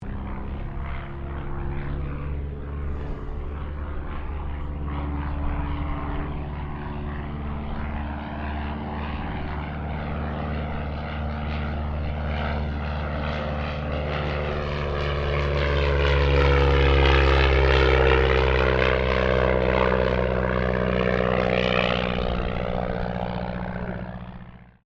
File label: AIRCRAFT DH82A DEHAVILAND TIGERMOTH PASS